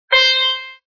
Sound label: error